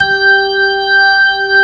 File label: ORG, Organs